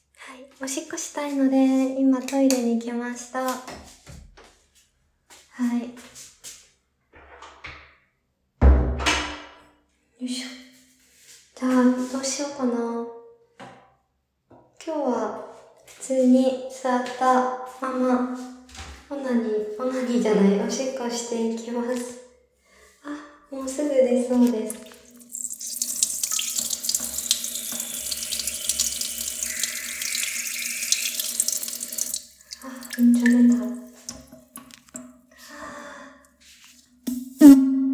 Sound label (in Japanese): おなら出ちゃった おトイレで オシッコですッ